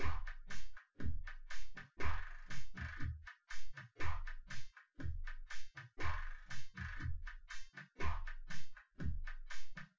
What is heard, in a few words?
wdrums synthconv 250ms 1m; SiSEC08; SiSEC underdetermined